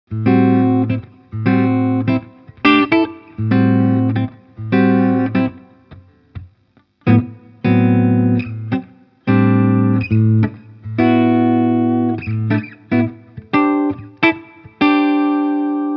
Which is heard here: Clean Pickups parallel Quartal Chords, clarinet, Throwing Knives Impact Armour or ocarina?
Clean Pickups parallel Quartal Chords